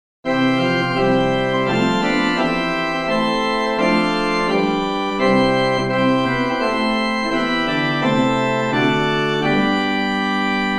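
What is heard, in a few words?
Great Principal 8 + Octave 4 + Mixture, organ; First Baptist Riverside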